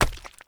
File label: Unarmed Punch Impact Rock; Weapon Impacts; Player; Environment